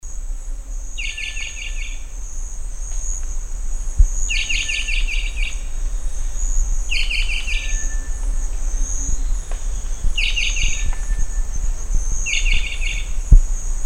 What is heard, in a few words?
Rondônia bushbird; پرنده بیشه مرغ راندونیا